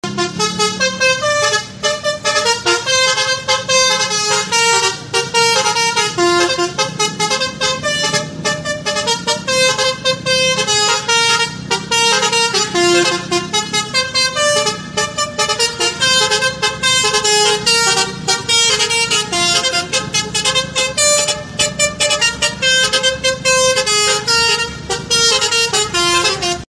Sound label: Klakson Basuri; Bgm